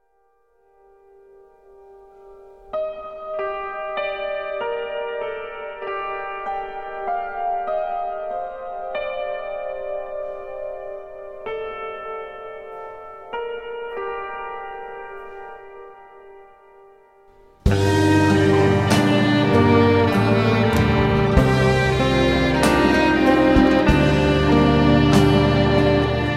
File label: Folk Aesthetic Version